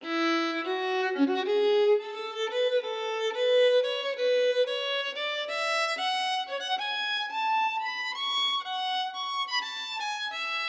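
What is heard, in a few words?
violin no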